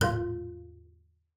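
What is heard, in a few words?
bass col legno F4; Xsample VOL-5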